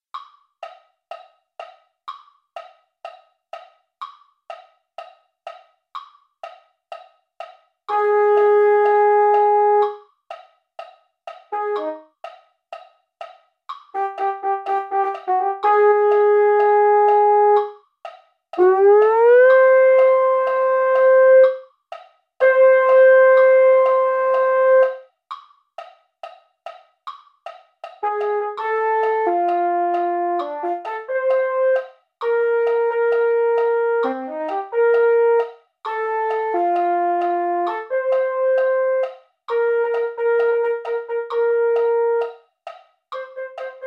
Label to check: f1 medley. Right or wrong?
Wrong